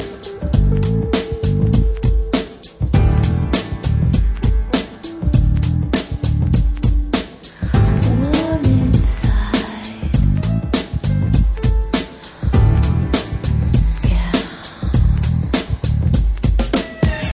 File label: slowly